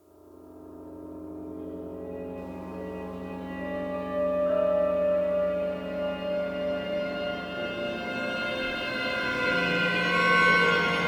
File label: Mood Nazi Creepy